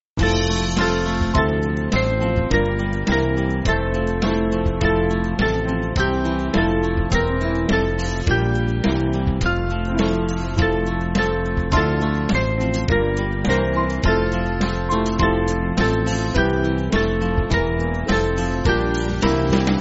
Band, Music Backing Files for Churches

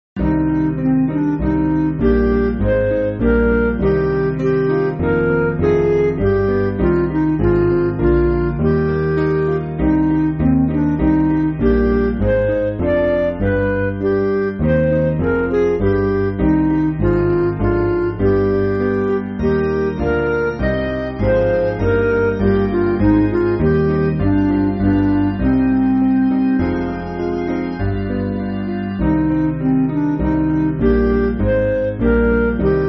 Midi, Music Backing Files for Churches

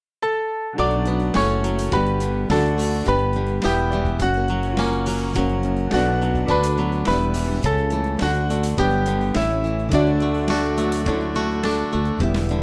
O Trinity Most Blessed, Band; Small Band; Freifaltigkeit

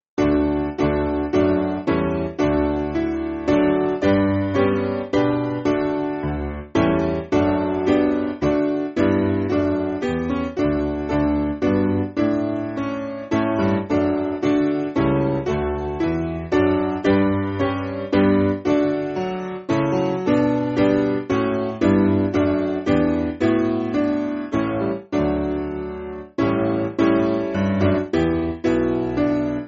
Piano, Backing Files for Churches; Thou Hidden Source; Stella

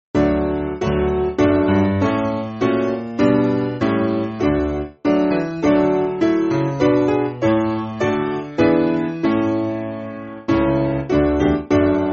Come Holy Ghost SPiano

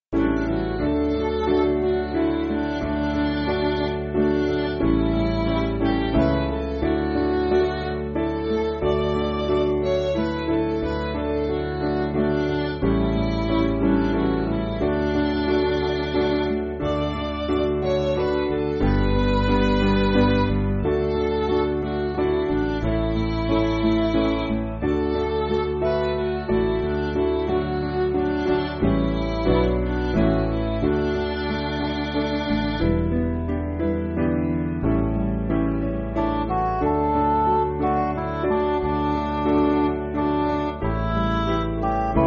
Midi

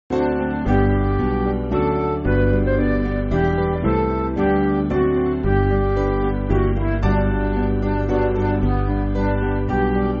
Midi; All Hail The Power

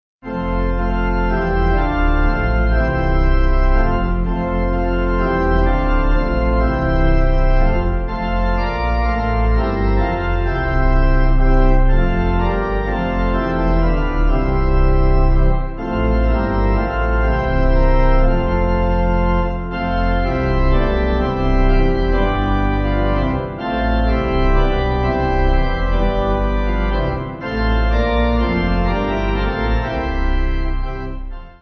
We Thank You, Shining Day, Pipe, Pipe Organ; 7.7.7.7.6.D

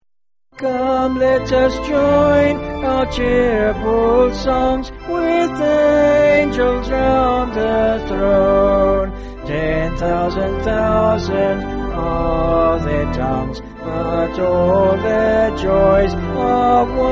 Come Let Us Join Our Cheerful Songs, Cheerful

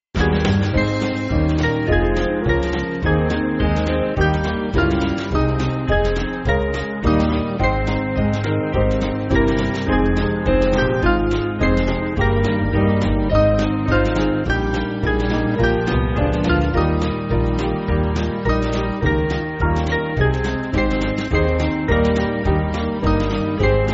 Band, Backing Files for Churches; Uprouse Ye

